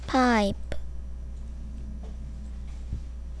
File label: pipe